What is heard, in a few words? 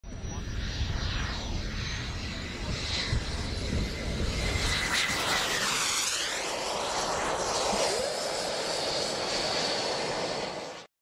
SR-71 Blackbird, هواپیما